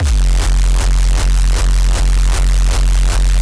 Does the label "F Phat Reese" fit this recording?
Yes